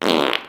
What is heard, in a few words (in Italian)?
Scoreggia